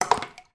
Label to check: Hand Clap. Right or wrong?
Wrong